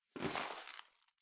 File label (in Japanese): ゴム手袋を取る音